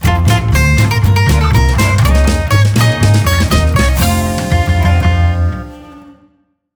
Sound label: Match Win; Glass Joe